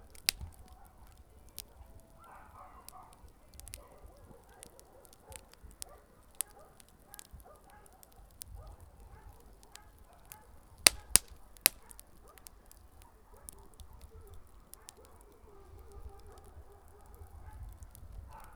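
small wood fire crackling, Recorded outdoor near a barbecue fire with a Tascam DR 40 linear PCM recorder; A tennis ball dropped on a wooden floor, recorded with a Tascam DR40.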